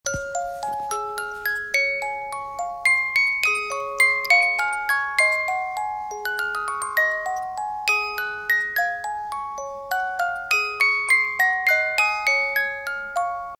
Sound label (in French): Boite a musique banquise